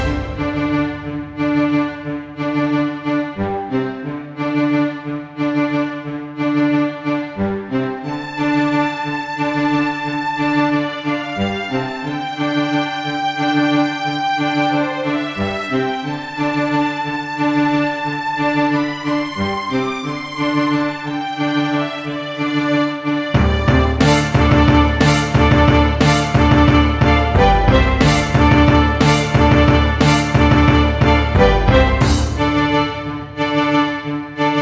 pirate

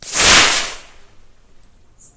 mortar; hedgewars

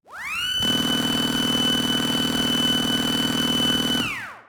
minigun; hedgewars

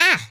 Ooff, voices; pl; hedgewars; Default pl

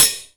knife impact; hedgewars

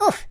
Ooff, voices; Default pl

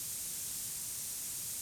dynamite fuse

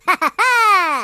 Laugh, British; hedgewars

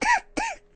Poison Cough; hedgewars; British

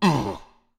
hell ooff; hedgewars

